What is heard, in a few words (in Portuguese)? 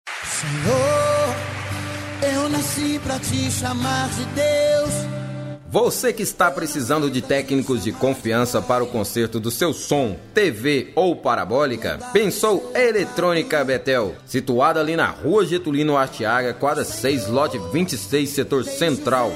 vinheta eletronica; bethel